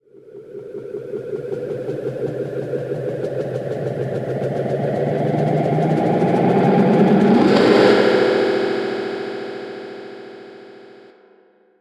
Build Up

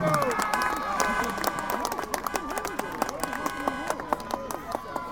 Crowd Cheer

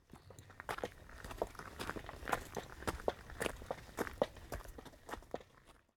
Walking On Stone

